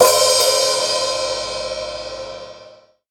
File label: Sabian Aax Hihat 14 Open Powerful